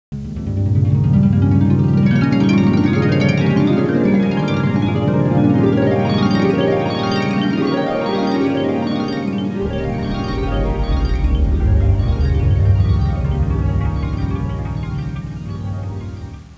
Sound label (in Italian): musica newage